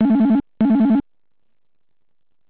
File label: Ring